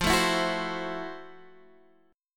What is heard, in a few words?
F Major 7th Flat 9th, F, guitar; strummed; JGuitar